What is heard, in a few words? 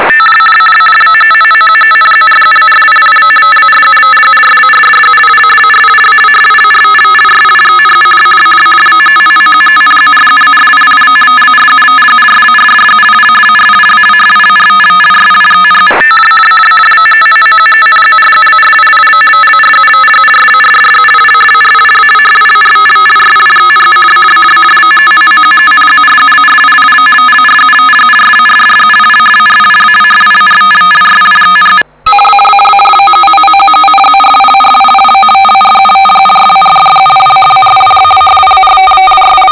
RTTY 50baud 7bit 450Hz; Globo Condor; LW2DTZ